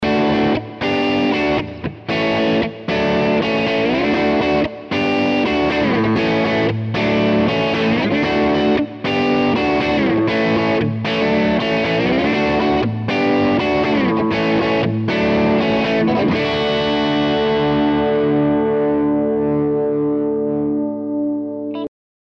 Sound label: Epiphone Custom Les Paul Bridge crunch